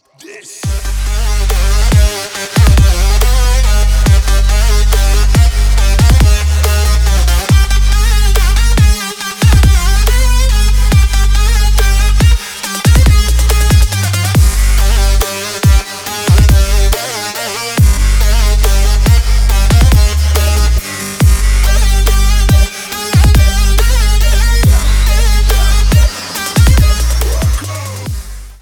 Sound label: ethnic trap remix